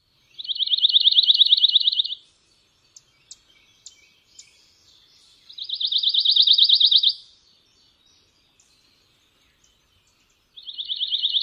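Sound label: songs, pine warbler; TN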